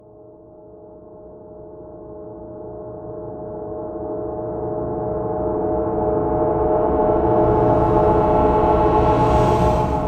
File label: Dramatic Build Up Horror Ambiance